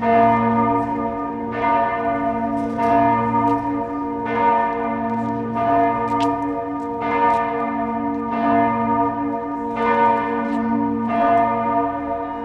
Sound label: Bell, Salzburg Bells